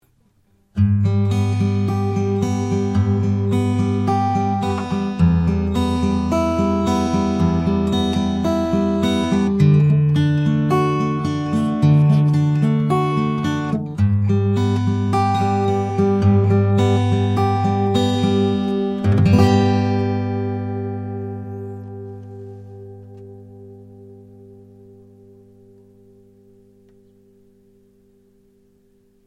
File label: guitar; Shure SM4 大振膜电容麦克风上手体验